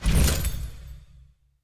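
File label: weapon switch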